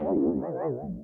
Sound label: spirit death; FlyForInsanity